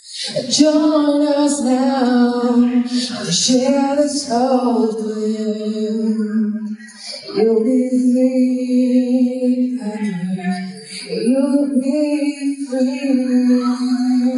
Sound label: free vocals